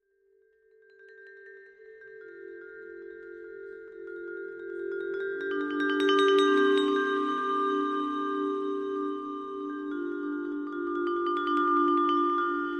Vibraphone Crescend; mallets

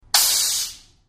Air Release Valve

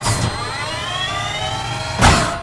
Fast Whine Up Cartoon, servos

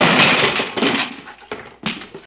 Metal Junk Crash

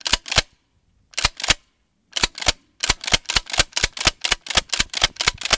Shotgun Cock CU Repe; mvmt

